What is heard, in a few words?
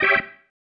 Stab, Organ, One Shots